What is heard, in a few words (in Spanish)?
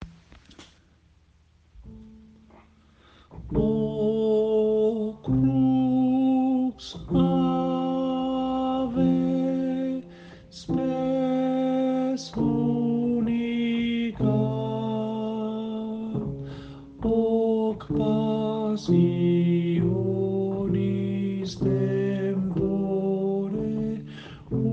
Tenores; O Crux Ave